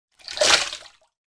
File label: household water squeezed from sponge into kitchen sink bowl